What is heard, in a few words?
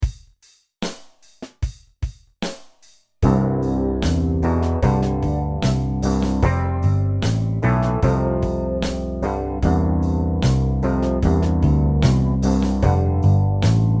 MIDI ditty; spellman studio